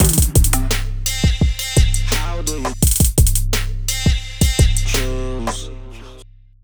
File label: Hip Hop Kit